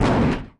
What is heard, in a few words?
car metal large impact; factorio 2.0.55